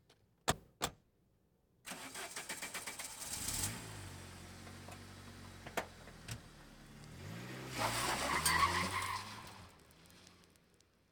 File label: Automobiles various; Valentino Production Sound Effects Library CD50